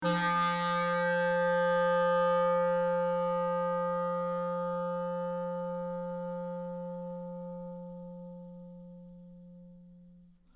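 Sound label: clarinet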